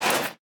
Death Drag; smite; izanami

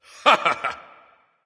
"heh heh"; smite; voicepack; ullr

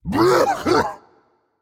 clear throat, voicepack; smite; jorm

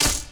impact; arachne; smite; arachne skin9

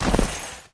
step with armor; smite; chronos

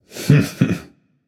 heh heh; smite; Ullr

